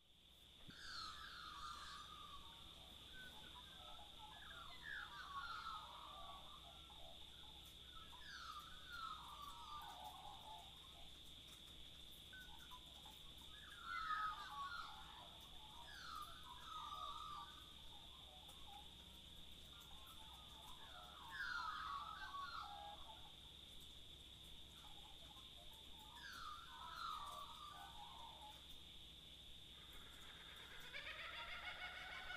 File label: Australian Birds